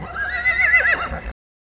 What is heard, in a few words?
Przewalski's Neg High